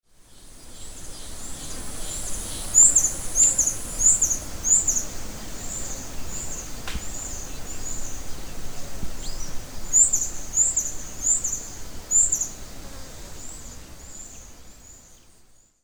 Hylocharis cyanus Beija flor roxo

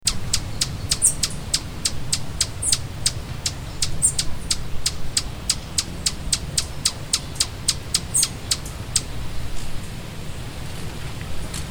Aphantochroa cyrrochloris Beija flor cinza

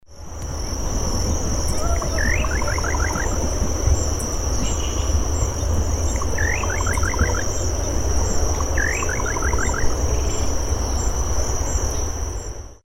Hydropsalis parvula Bacurau chintã

